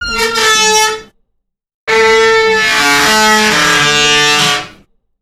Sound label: creaking iron gate